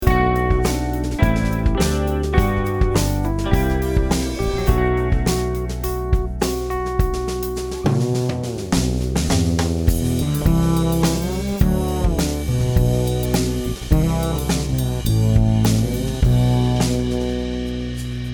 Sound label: Bass